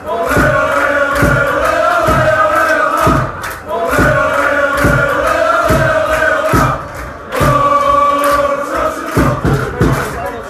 woooooah, fan chants; excelsior

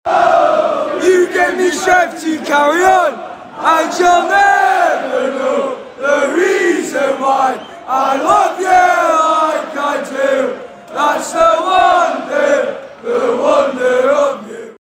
chant, fanchants; port vale; united kingdom

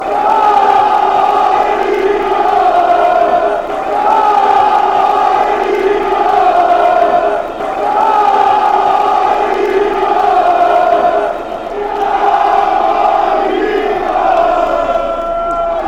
come you whites, chants

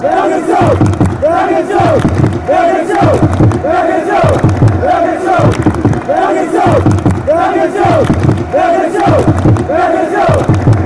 bergressio, fan chants

